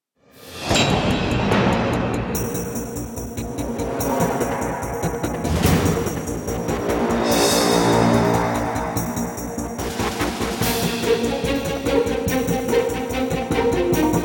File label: Combat Dogfight; Elite Dangerous